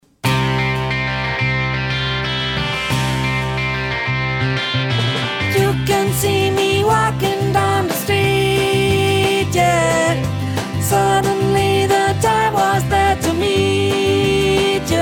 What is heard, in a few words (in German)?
Sopran